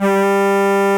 EX ST G2, ANA STR

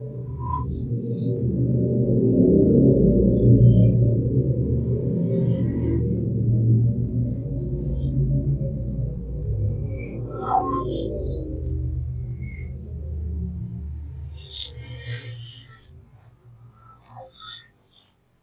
Underground; Sonic Foundry (Sony Creative Software); World Pop

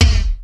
R8 ELECTRONIK; Rubber Chicken Software Sample Bank; DRUMS + PERC

